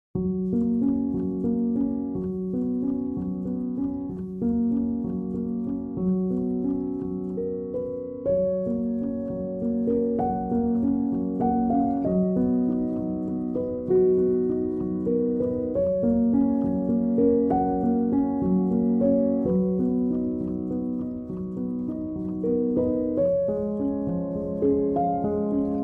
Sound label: song calm nature; dolphin tunes